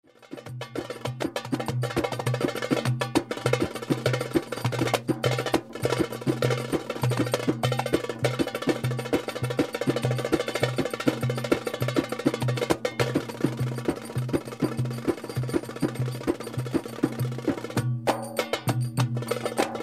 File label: Hot Tabla Solo